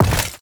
metal small equip, inventory

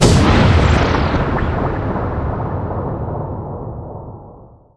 void nihility; weapons; dota; nightstalker

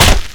sand king attack; dota

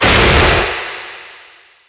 blade; lina; lagunablade immortal

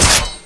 attack; dota; juggernaut

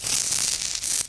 acid spray damage; weapons; dota; alchemist

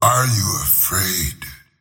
attack pain; slyrak; dota

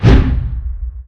preattack; dota; axe

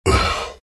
pain, vo; arc warden

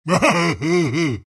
alch laugh; dota; alchemist

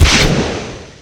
powershot; windrunner

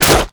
attack; dota; lifestealer

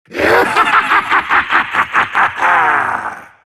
silt laugh; dota; siltbreaker

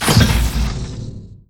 stasis trap plant; techies

